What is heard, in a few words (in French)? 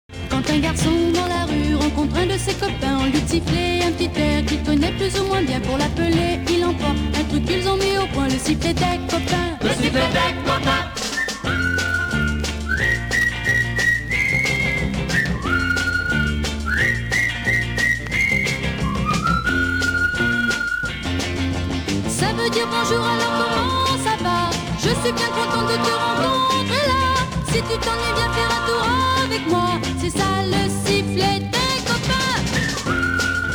sifflet